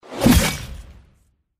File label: skill sound; fight